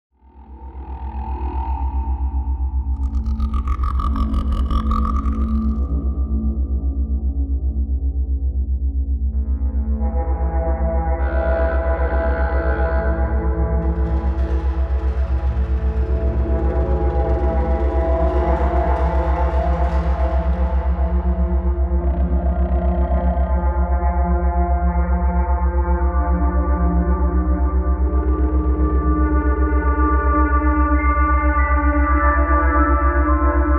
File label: Binaural; Caves of Qud; Binaural Concept